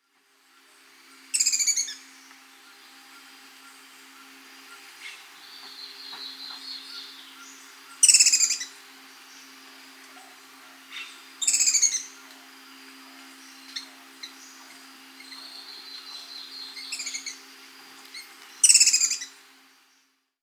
Sepia-capped Flycatcher (Leptopogon amaurocephalus), Peru; S. America